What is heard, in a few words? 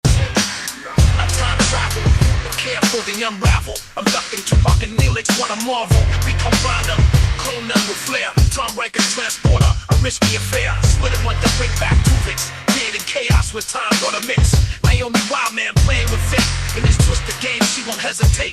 rap